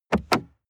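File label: sports car interior glove compartment open short; verkehr-transport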